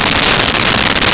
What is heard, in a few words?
Helicopter; Nintendo; July 2020 Gigaleak